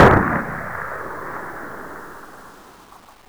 axis sniper distance; WAR

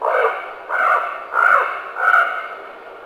raven

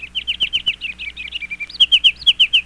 kinglet; WAR

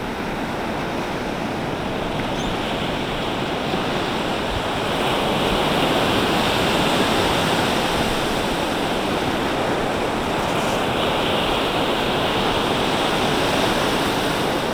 water ocean; WAR

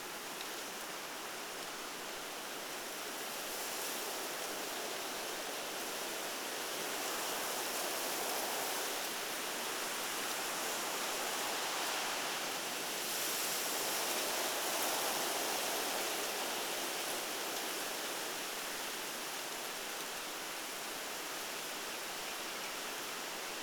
wind through trees far; WAR

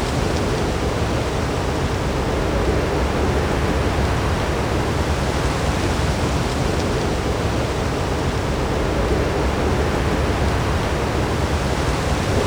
light wind through trees; WAR

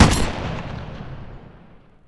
m2 mg fire; WAR

